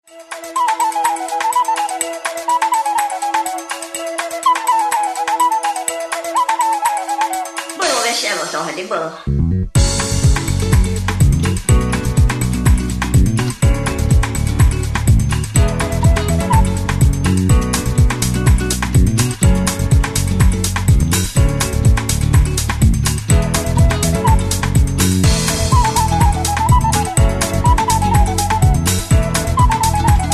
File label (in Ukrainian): skrypka vechornyci